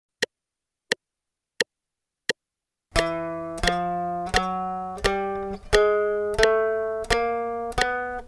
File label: quarter notes, guitar